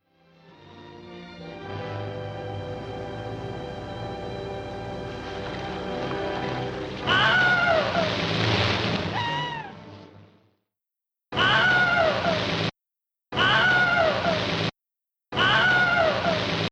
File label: Wilhelm scream